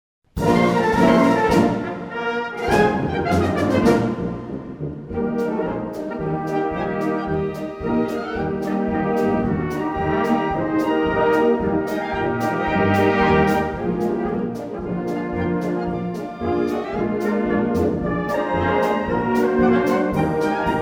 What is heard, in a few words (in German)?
Promenaden Polka